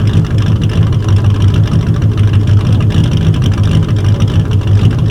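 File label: dodge idle, car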